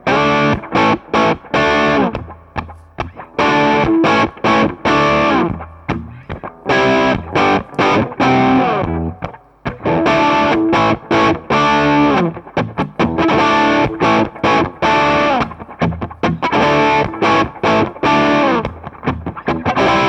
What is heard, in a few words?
Classic Rock